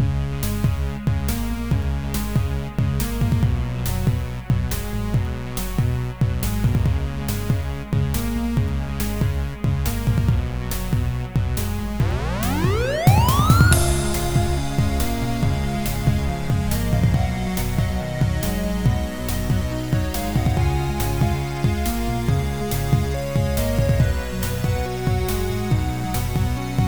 Outer Space Station

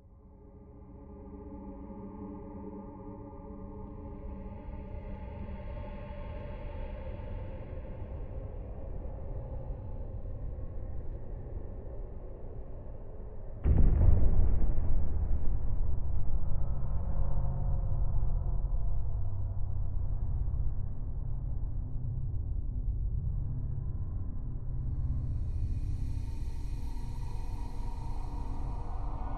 spooky space, ambience; vgstation13

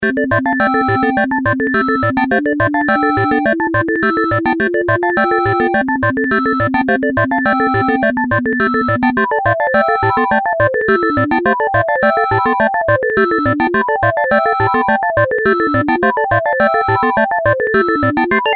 bleep bloop bloop bloop; Summer Vacation

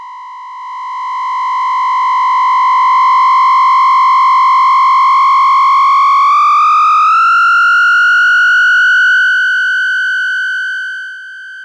volume test, Theremin; Building a Theremin

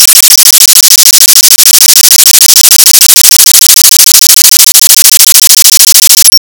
Sequencer sync Out

